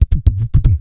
WOOF DOWN, Vocal Planet, Blues & Soul

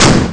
drum power; Amiga Soundtracker Sample Packs

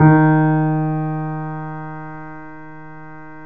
3D Pianos Yamaha C7; E-MU Producer Series Vol. 5

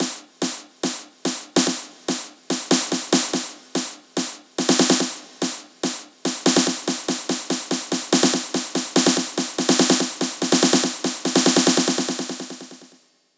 144 BPM Roll Snare; Vengeance - Essential Clubsounds Vol.5; Flight Kit Root Amin; Drums & Perc

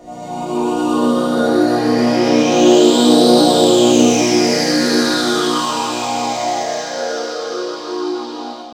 pads F#; Zero-G; Total Drum Bass

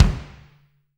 BD, DR BD; Best Service Gigapack 2

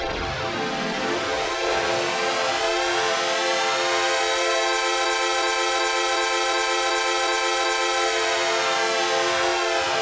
pad

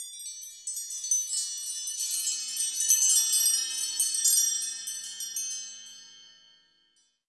bells, percussion

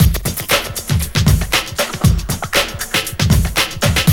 120, LOOP, Roland; Sound & Vision - Gigapack I

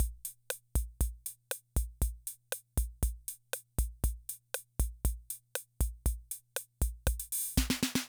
Loop, rhythm 55, classic machines

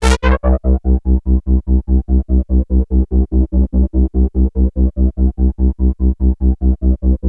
analog, special fx twisted; USB Soundscan; AKAI

